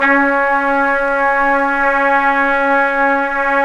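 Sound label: trumpets swell; mp f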